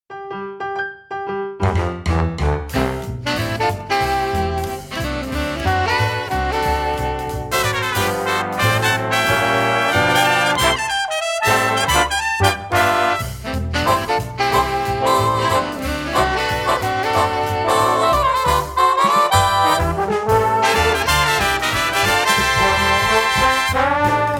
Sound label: jazz